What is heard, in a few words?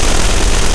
23mm gun close loop